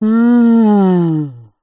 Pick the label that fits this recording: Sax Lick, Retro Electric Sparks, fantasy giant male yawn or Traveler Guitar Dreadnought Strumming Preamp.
fantasy giant male yawn